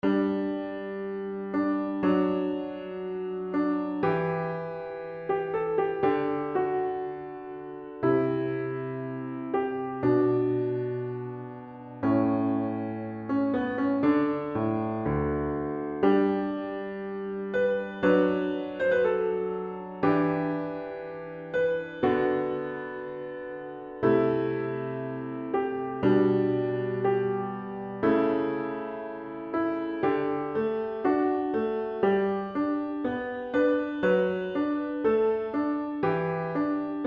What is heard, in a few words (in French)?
Choral